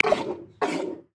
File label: Walrus, Polar Region; Xiaomi MIUI-14